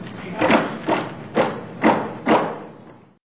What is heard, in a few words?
hammer; ambient; Counter Strike Model Pack; CS:GO